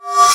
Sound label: Teleport